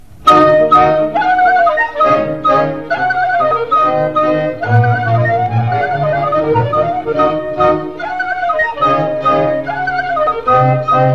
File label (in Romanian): Hora Cobza, Roumanie